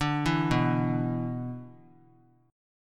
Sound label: B-Minor Double Flat 5th; Bmbb5 arpeggiated; JGuitar